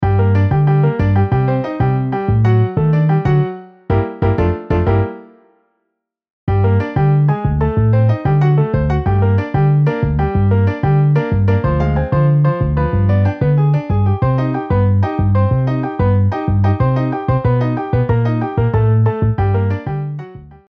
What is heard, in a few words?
Piano Bass MIDI